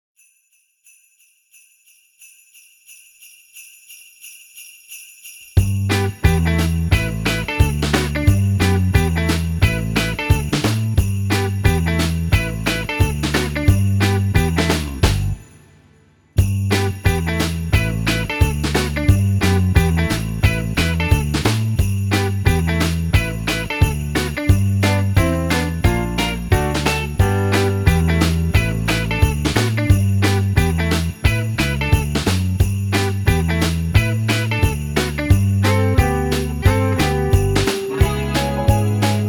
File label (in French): version instrumentale